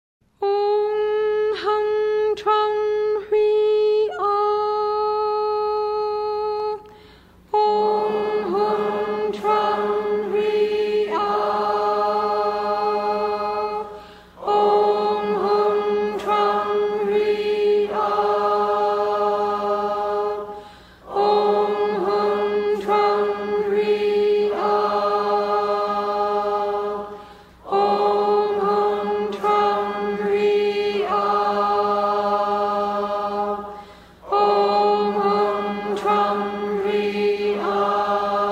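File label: On Hum Tram Hrih Ah, Mantra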